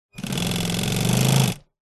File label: industrial sewing machine operate burst walking foot; brother